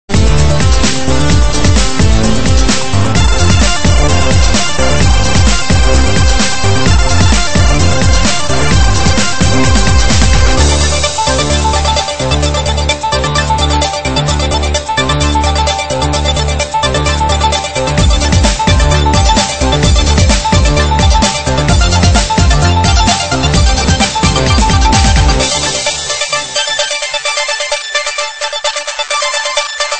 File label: Breakbeat Remix